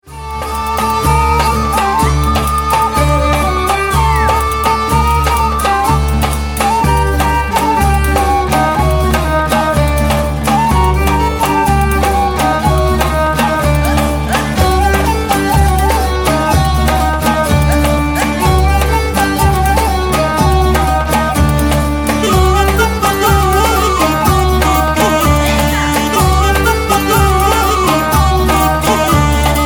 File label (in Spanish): arpa